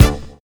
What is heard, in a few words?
HIT, Hits; Houseworx